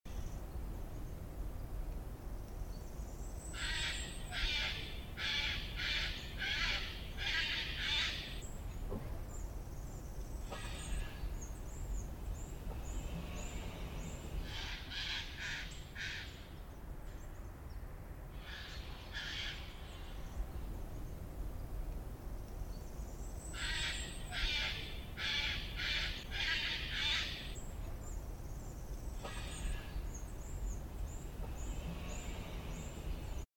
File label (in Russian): vorony krichat v pustom parke